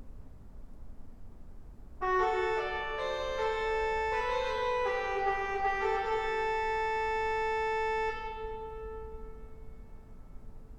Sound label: cor de kruyter, organs